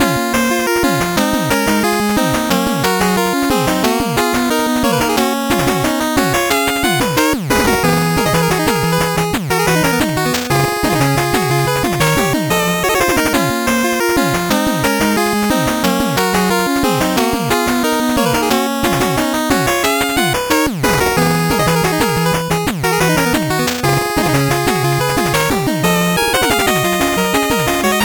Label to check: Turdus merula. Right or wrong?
Wrong